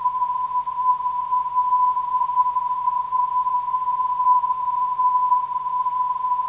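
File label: non-directional beacon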